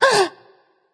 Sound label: nv breath; smite; voicepack; ganesha